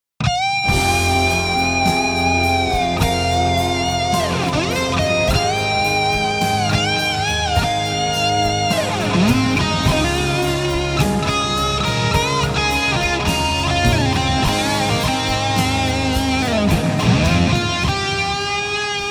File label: solo EMG Strat